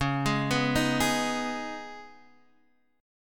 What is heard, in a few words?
Db 7th Suspended 2nd, Guitar, Half Step Down